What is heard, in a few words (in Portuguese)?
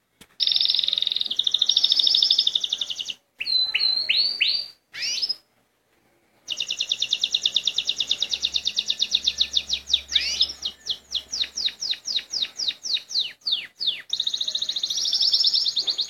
canto do canario Llarguet